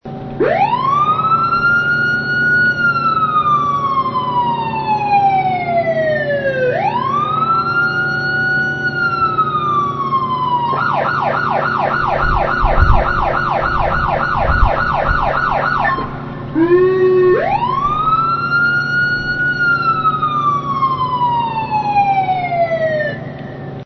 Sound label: Siren Tuned